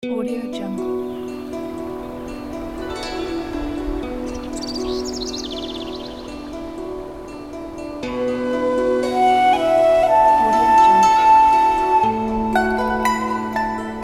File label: chinese chill